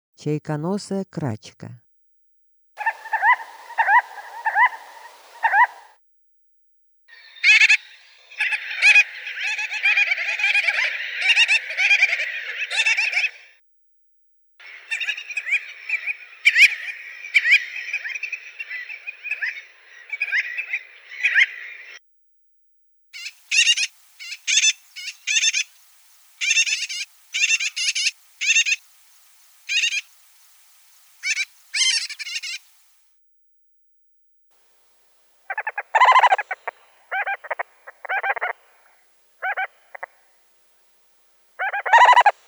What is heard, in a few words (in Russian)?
chaykonosaya krachka golos